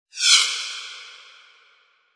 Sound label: Whoosh